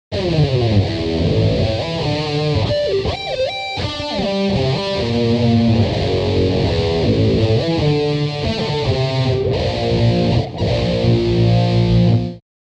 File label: Pedal Bend